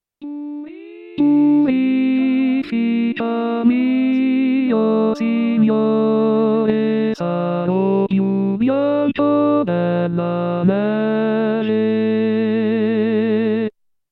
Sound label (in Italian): Purificami Rit tenori; anno liturgico